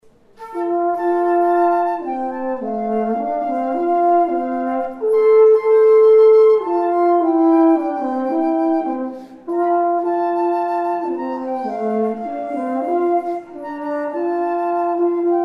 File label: Flute Horn Duets, African American Spiritual; My Lord What a Morning